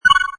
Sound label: Digipanel, Button sound; Multimedia